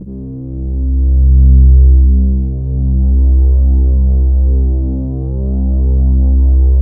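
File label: C2 big orchestra; Sound Gallery - Charged; BIG OBERPAD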